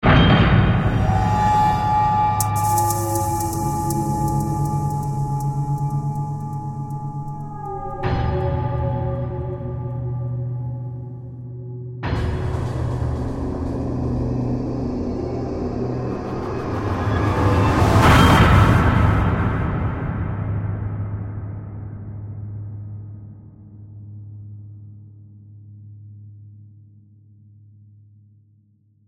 Composition Metallic, Horror, Composition, Metallic, Hits, Tonal, Eerie; Scary Soundscape